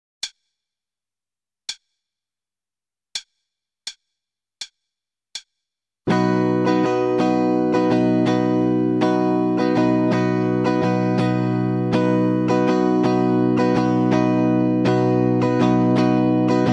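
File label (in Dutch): Ritme, gitaarstarter; Meespeel CD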